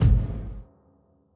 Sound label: Cave Kick